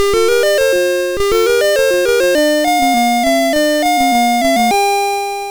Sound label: sid MOS6581R3, GAMES; Alice in Wonderland; hvsc